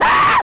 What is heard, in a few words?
Screams; Ren and Stimpy Time Warp; SNES